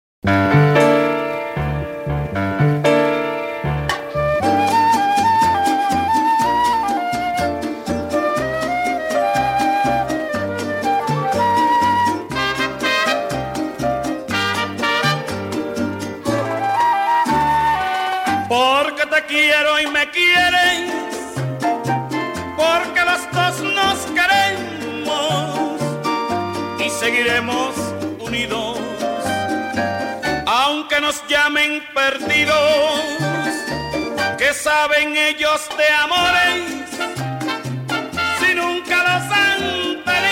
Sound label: brass